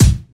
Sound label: Hip-Hop Steel Kick Drum C Key, Royality free kickdrum sample tuned to the C note.